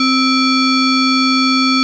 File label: bell